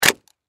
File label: Can Crush Step On, Can Crush Step On Crunch Aluminum Empty; Objects; Foley; Blastwave